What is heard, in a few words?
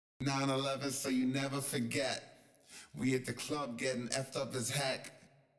exciter Off